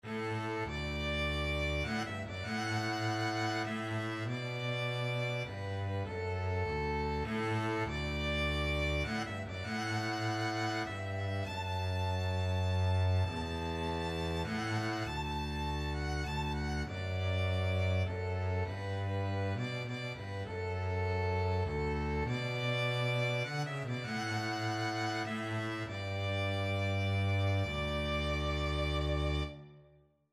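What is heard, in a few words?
amazing grace, violin double bass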